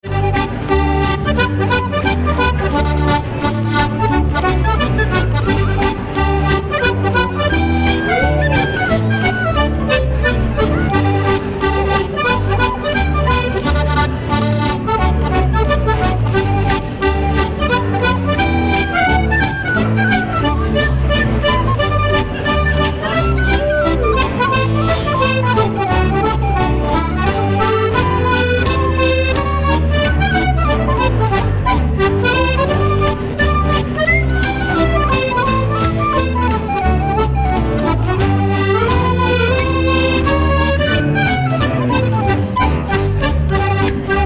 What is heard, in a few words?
Instrumental English Hornpipe